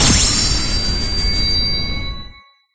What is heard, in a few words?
Float; Life of a Naomi